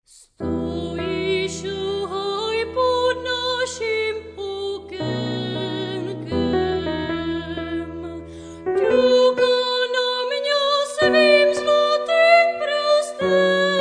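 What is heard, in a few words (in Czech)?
zpěv, klavírní doprovod; Leoš Janáček - Moravská lidová poezie v písních - Pérečko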